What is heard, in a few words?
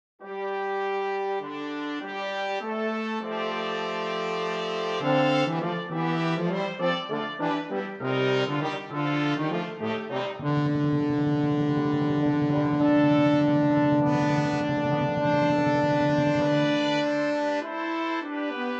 O Come All Ye Faithful Fanfare